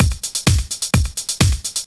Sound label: Clubhouse Loop 128 BPM